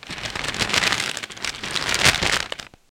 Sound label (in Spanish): sonido realista de cartas